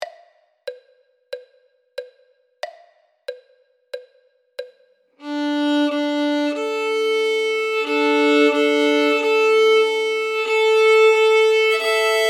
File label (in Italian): violino I e II